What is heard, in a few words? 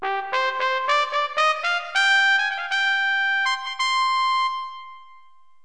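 trumpet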